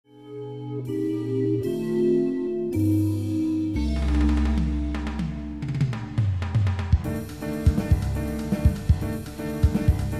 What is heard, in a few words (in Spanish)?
Jazz fragmento